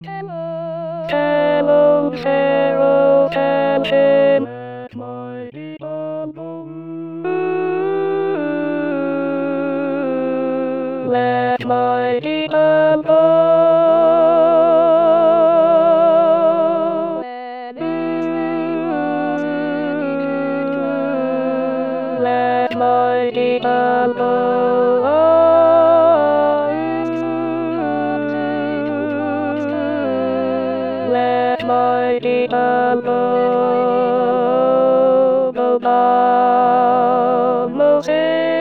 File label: Alto; Go Down Moses